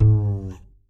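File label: acoustic bass slide down 3; AC.SLDDW; Masterbits CLIMAX 7 - Bass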